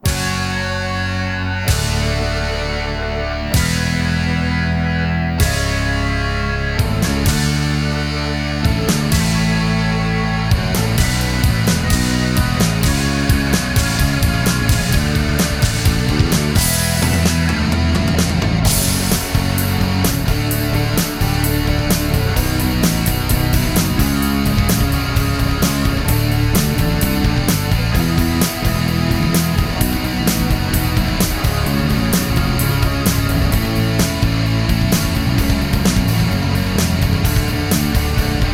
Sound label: karaoke full mix